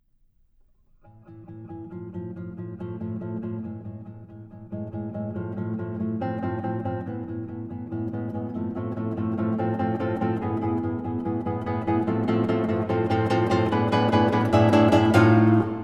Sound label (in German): Gitarren Duo